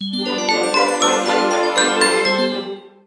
Mission Success

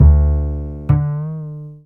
Level Up; Swapperoo; Android iOS mobile Switch Windows

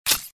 Sfx Enemy Murloc Attack

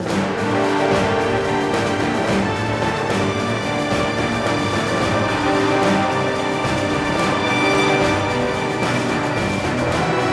D-Day Combat

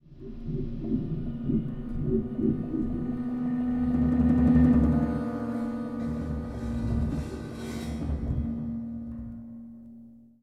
Jungle Level Beginning

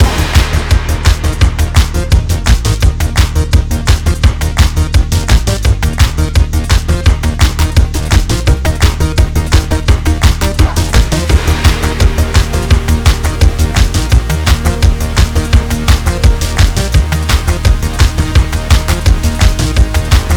dojo punchy loop; OK K.O. Lets Play Heroes

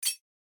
Disc Spawn

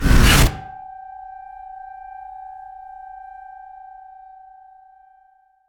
River Stingers; Outlast 2

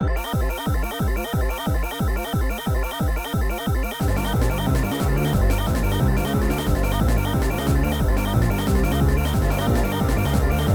Stage BGM

